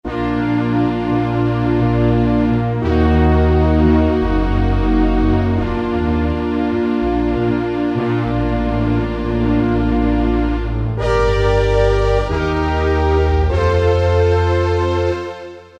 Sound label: JX Horns-Strings; Factory preset demos